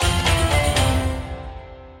music big win outro; medallion Desktop; Fantasma

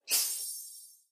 bonus tile reveal; punk; cassandra

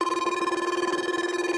Base Tally Loop; Mahi Gaming

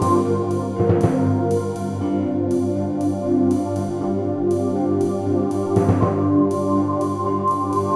bg Music; loki Wild Tiles Desktop; Ingenuity Gaming

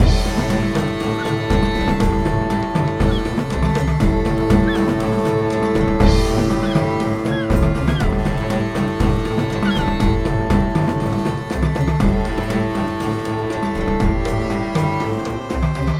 Bonus Loop Intro; genesis; jasons Quest

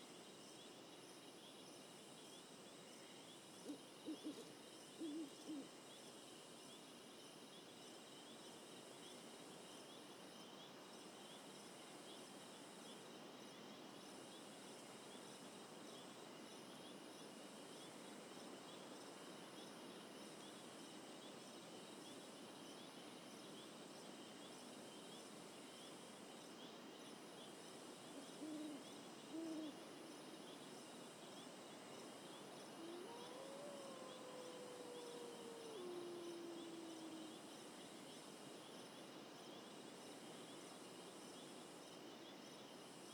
Ambient; Mirror Magic

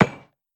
Ball Save; genesis; euro Golden Cup Desktop

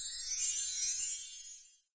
coin drop end; Recode; rampage riches

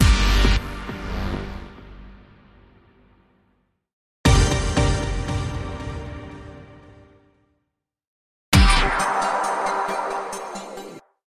Win Sounds Symbols; Mahi Gaming; playboy Gold

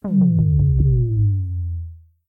reel suspense lose; Rabcat; Classic 243

